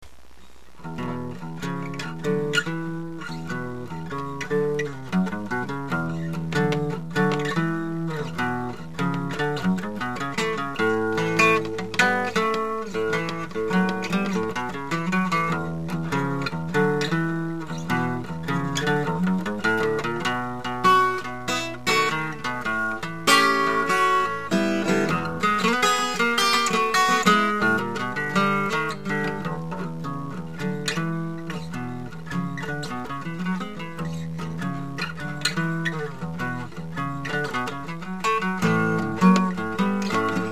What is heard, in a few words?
Blues